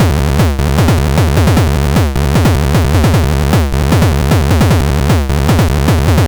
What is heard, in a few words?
LSDJ Square Kick Loop 153BpM, Nintendo Gameboy; maurice.rebell Loops